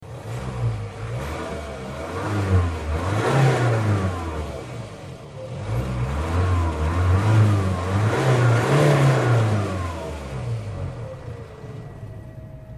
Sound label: Range Rover Evoque